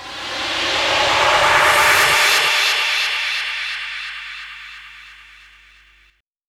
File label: rise, fx & sweeps; EZRollers